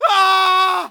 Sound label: npc male scream first; DeadFright